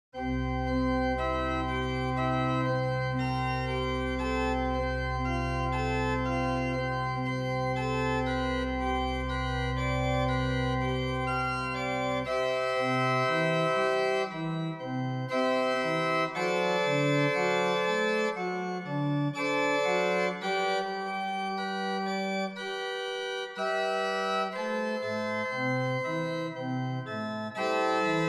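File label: Praeludium, organ; 1980; Grenzing; Menesterol; Bach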